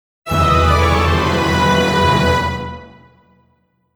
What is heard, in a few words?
Defeat Jingle; Player Out; Pachislot Akumajo Dracula